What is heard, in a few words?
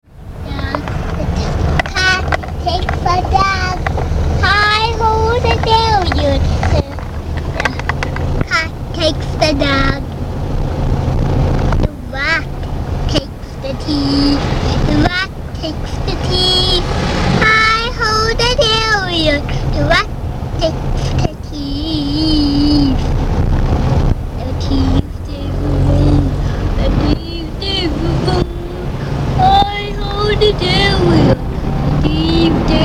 sings in car